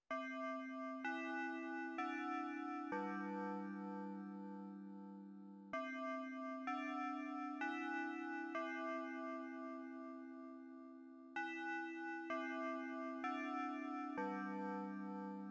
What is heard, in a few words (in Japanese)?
耳舐め; 【3限目】